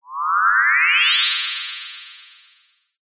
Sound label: cartoon sci fi ascend beam teleport; zapsplat